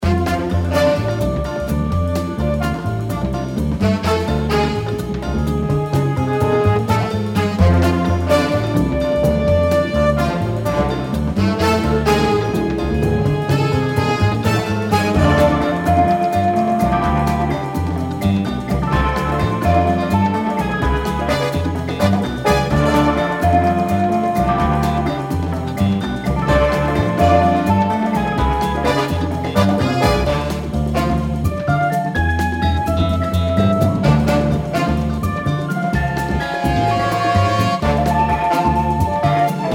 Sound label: Boss Music; Omega Agent